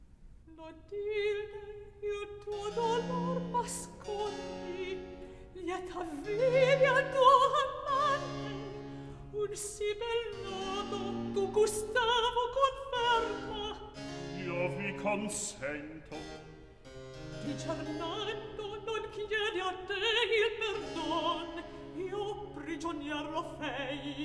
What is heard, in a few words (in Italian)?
Recitativo; Clotilde, il tuo dolor; Handel Masterworks; Klassika; Act III